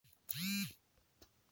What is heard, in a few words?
Vibration